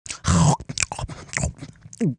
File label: nom nom